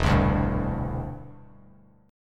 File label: E Minor 7th Sharp 5th E; strummed